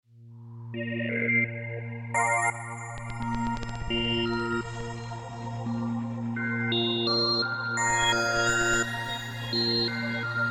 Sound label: ocean